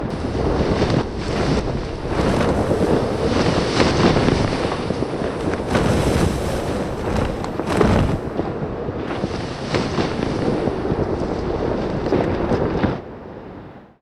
banner mid bridge; LoL; emitters map12

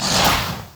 ghoul; LoL; yorick

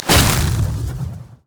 frost scorched earth; LoL; shyvana

